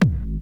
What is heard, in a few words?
hard bass drumz